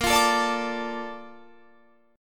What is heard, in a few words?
Bb Major 7th, Guitar, Drop D